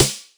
Snares, Rimshot

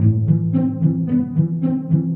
strings, Loops, Sad

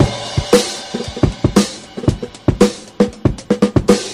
cymbalizm, funky drum loops

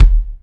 Kicks Loud

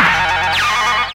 zapp

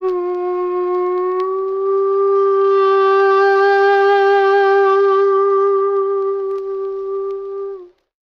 flute, Jazzmatazz loops

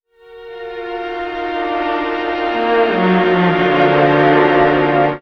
strings slow build, Sad; Loops

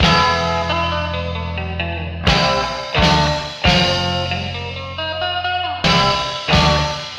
guitar; Phonky

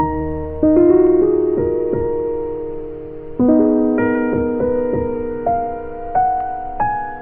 piano wistfulness; Loops; Sad

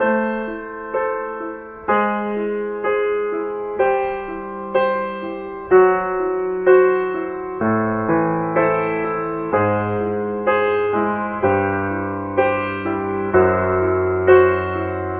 piano, sad loop

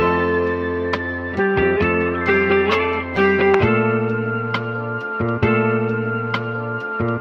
guitar

